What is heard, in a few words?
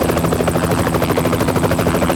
Enemy Swat Helicopter Move Loop